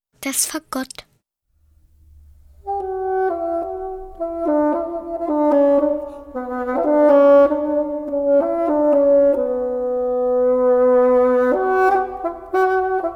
Fagott; Bilder einer Ausstellung

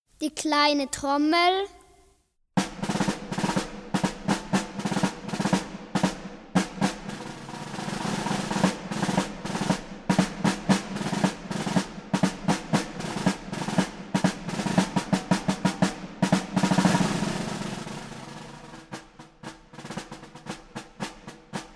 Kleine Trommel; Bilder einer Ausstellung

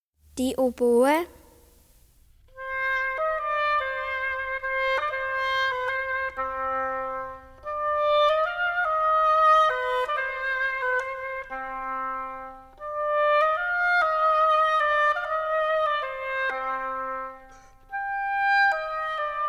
Oboe; Bilder einer Ausstellung

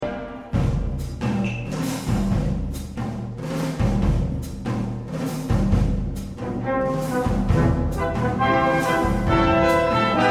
drumset, schlagzeug; Chicago

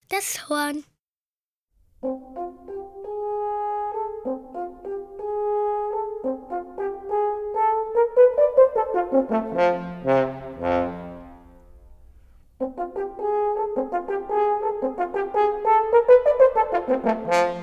Horn; Bilder einer Ausstellung

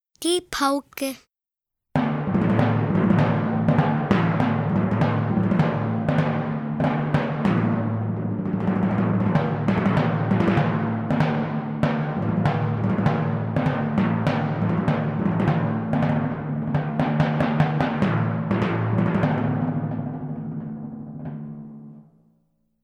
Pauke; Bilder einer Ausstellung

